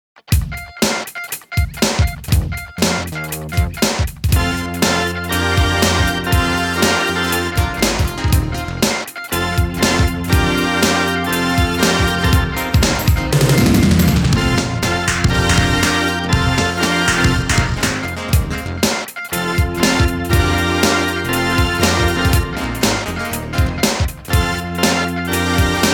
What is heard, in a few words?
OB-8 Funk